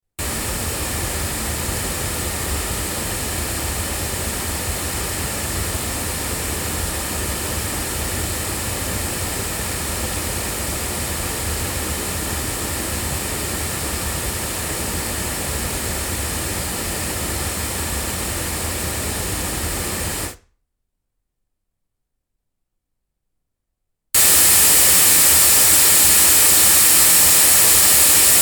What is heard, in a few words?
sE 3 loudspeakers; se electronics